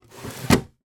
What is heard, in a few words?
refridgerator compartment drawer close